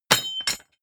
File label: drop metal stone; hits explosionen; metall